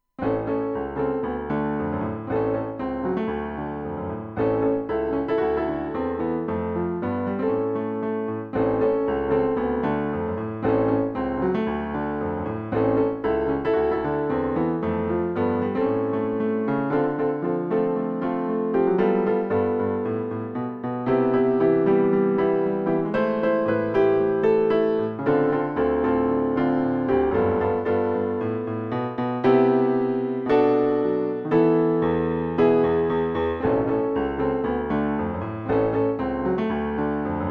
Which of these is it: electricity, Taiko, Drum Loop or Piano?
Piano